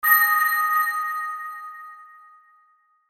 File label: Hit Bell Ring Delay; Blastwave